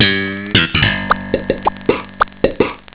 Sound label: Bass riff